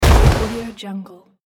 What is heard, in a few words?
body fall on floor